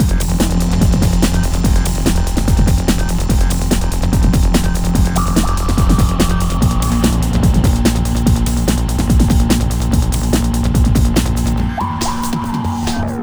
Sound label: 145 Cmin; Big Fish Audio; Hardcore Breakbeat Action; Decimater